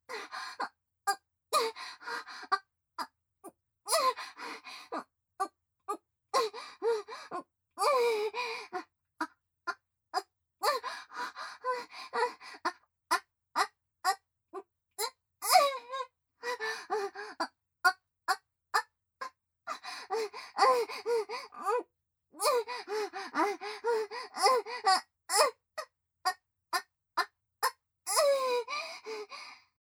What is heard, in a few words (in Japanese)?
正面近い・喘ぎ声・激しめ; リーシャ